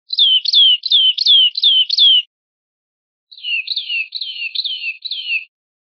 golpes corridos de yunque, verderon

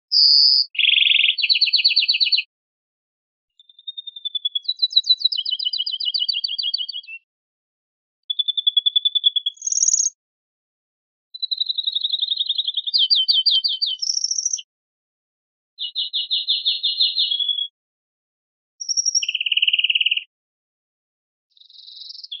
faltas acanariados total, verderon

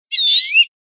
quejas mio, verderon

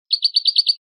bibleos golpeados graves, campero

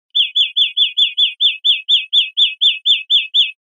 golpes corridos biobio, verderon

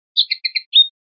pinzonada, pardillo

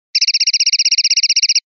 carretilla con a, pardillo

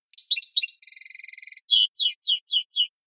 canario; campero

